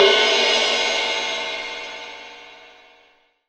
Crash Cymbal, 18INCH OLD MALLET

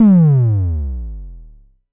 DSM2 Sub; MPC DSM-2

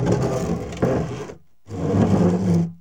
BOX SLIDE; Ambient Sounds; Box Noises; E-MU Producer Series Vol. 3; Hollywood Sound Effects

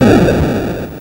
Atari Noise Long, Atari 2600

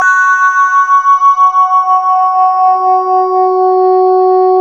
SYN SCANNE, Microwave; Sound & Vision - Gigapack I CD 2

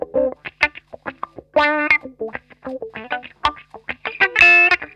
SHRILL WAH, Funk Guitar; Zero G - Funk Guitar

